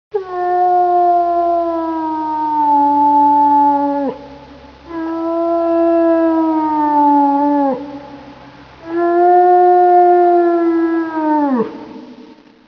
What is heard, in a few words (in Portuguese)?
lobo